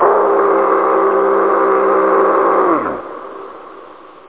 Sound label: aaoo, speech; da capo vol1